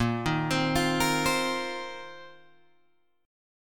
A sharp Suspended 2nd Suspended 4th down Guitar Open G